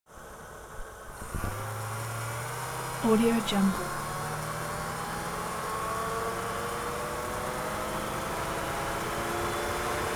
transportation vintage car chevrolet 57 drive engine; smartsoundfx